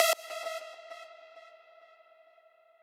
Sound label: lead